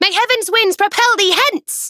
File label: swoo; dqxi; serena